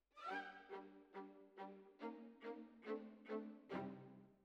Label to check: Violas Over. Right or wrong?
Right